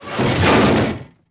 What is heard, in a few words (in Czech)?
auto plech; Mafie 1